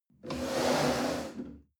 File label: chair wood slide on floor medium